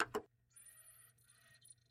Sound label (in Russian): zvuki cd-pleera